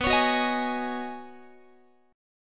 B 6th Add 9th B down Guitar; strummed

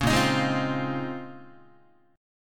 A sharp Minor 11th A sharp down Guitar, chord; strummed; JGuitar

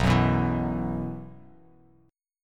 B Suspended 4th B, chord; strummed; Bsus4; 7,9,9,9; JGuitar

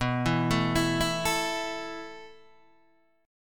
B 7th Suspended 4th B down Guitar DADGAD

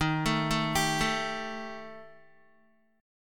Eb Major Flat 5th, Guitar; EbMb5 arpeggiated; 4F,4A,5C,5G,5C,6E; JGuitar

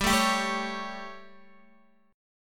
G Suspended 2nd Flat 5th chord, Guitar; Gsus2b5 strummed; JGuitar

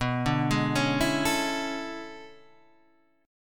E 13th B

